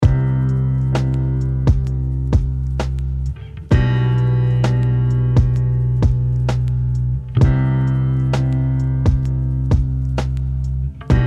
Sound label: lofi